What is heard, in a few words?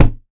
wood solid impact soft; physics